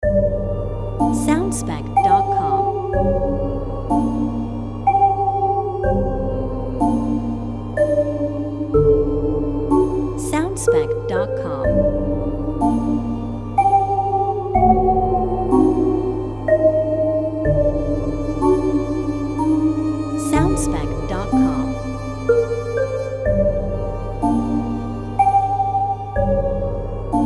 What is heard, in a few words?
Spooky House Voice in Background